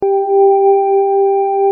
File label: lightbulb Voltage Note G4; faradays law